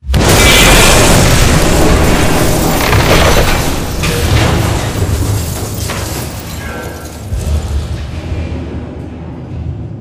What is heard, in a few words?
Explosion Dome Wall; Boomtown; Titanfall 2